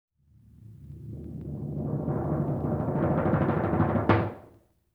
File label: LoFi Timpani Roll; Vintage Cartoon; Drums & Percussion